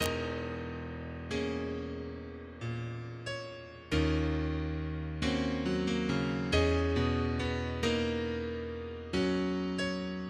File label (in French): exercise pour piano